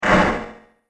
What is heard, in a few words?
Rough Metal Reverb Hit, impact; Miscellaneous Impact Sound Effects